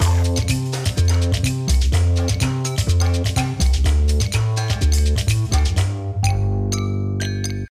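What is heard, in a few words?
food world music paradise fight rythm x bass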